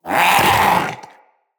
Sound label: Vocal Attack Short; Characters; Hunting Critters; Vulpaphyla